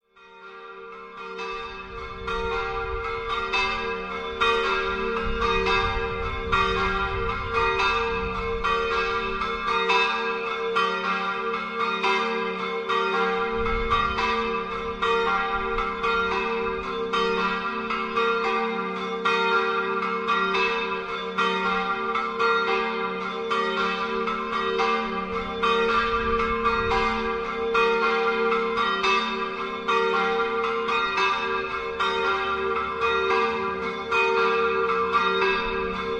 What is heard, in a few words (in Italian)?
San LEOPOLDO, campane